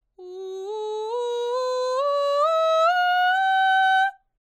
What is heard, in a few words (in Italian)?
Scala U